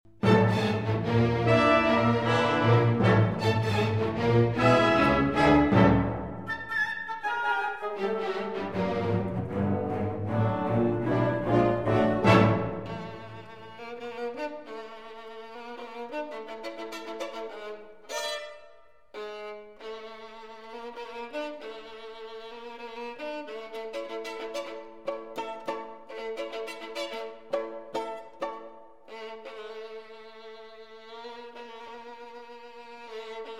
Violin Concerto in D Major III. Finale (Allegro Vivacissimo)